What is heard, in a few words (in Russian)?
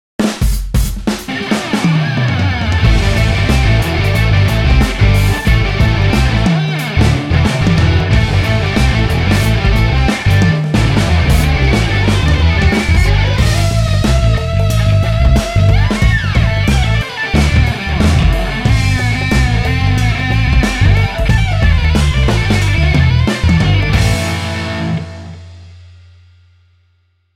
rock skripka